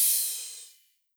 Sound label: soft hit finish; osu!